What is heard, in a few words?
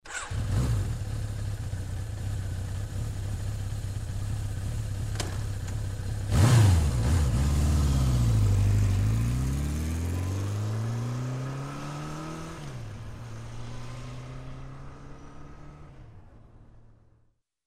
Motorcycle starts, drives away